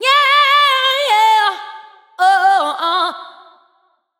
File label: vocal adlib yeah ah run Dm